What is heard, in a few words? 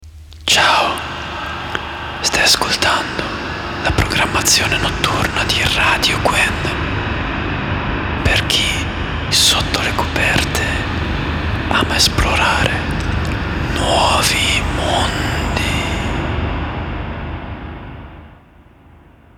Jingle